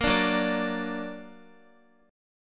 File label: Gb Augmented Guitar; Listen to Gb+ strummed